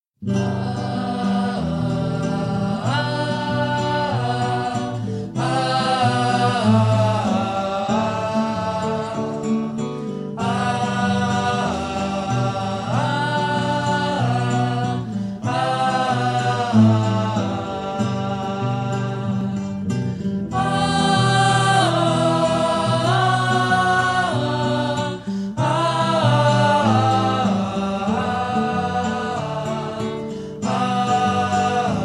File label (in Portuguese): cantico da ressurreicao aleluia